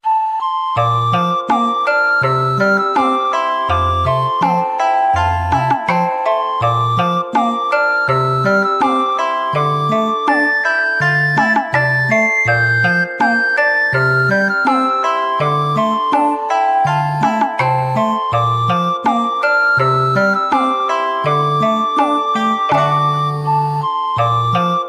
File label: Jingle Map